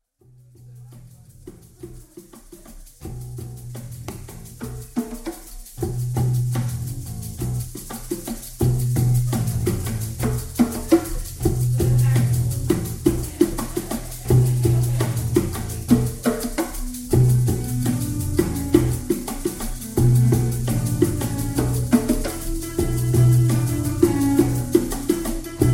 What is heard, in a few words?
moroccan swing